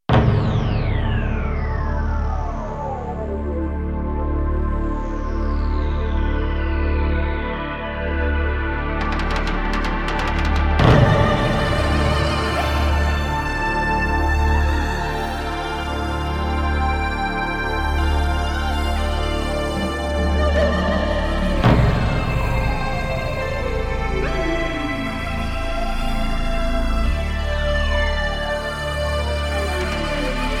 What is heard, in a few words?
Instrumental